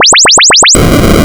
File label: burn; DroidQuest